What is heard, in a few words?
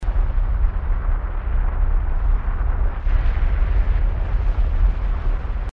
ambient; rocket trip